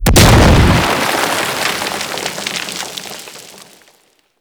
Mortar Impact; Gameplay; Warframe; Tau

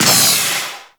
Proc Radiation; Warframe; Mods

